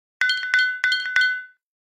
Message, Nokia Alert tones; Nokia 6267

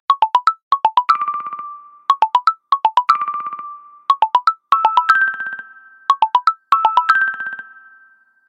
Trill, ringtones; Tecno Spark Go 2023

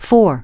digit 4, keypad tone; ZTE F451

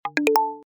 Success

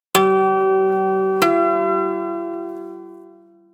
notifier minimal bands; ZTE Open C

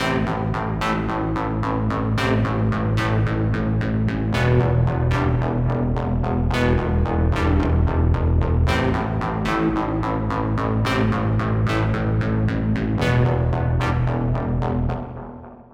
Spaceship; Alcatel 5049W T-Mobile REVVL; ringtones

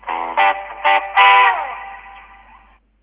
jazzy; Alcatel OT-535 PC Suite

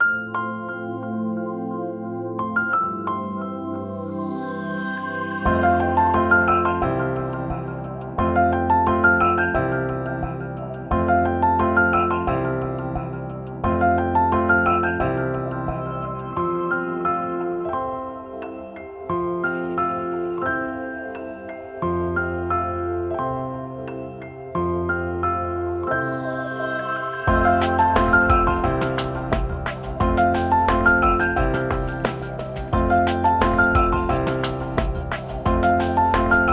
Coming call, ringtones; Meizu

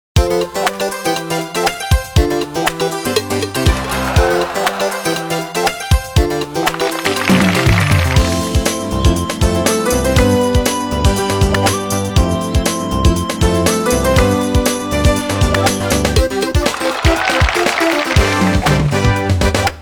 Golf ringtone; Nokia; audio themes

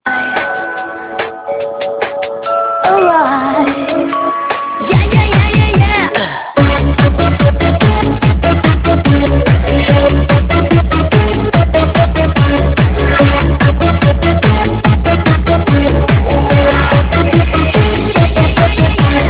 receiver test; i-mobile; 5511-3G

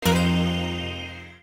Tranquil slide close; Nokia; audio themes

